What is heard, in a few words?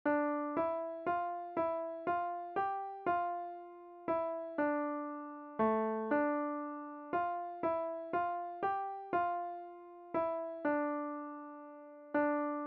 Chant des Flamands, klavier